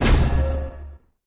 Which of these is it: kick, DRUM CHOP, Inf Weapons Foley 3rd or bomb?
bomb